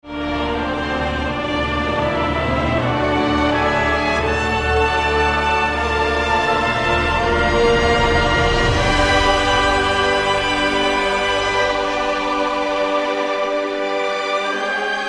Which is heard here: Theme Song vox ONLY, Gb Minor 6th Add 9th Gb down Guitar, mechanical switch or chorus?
chorus